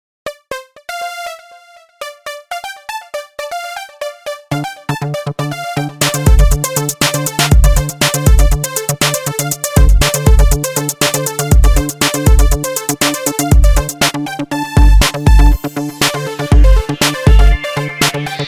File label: synth